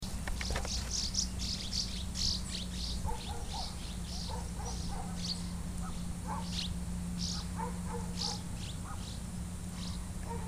andorinha serradora, aves

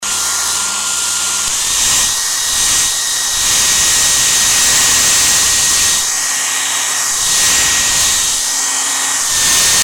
secador

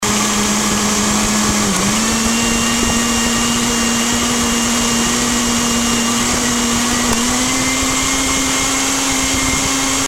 liquidificador

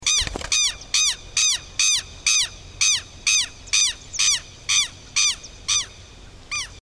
quero quero, aves

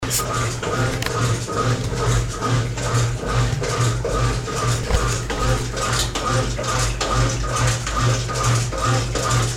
maquina

